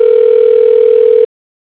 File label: RINGBACK with Pause